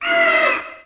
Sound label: bird